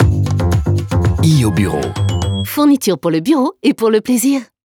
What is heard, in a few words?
Jingle Signature; B iOBURO